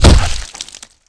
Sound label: hit blood, skater; Tony Hawk's Project 8; XBOX